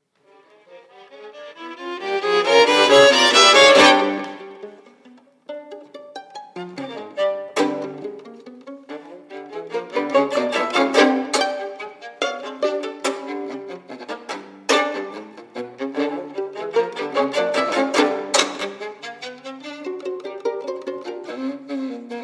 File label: pizz; jumalantauti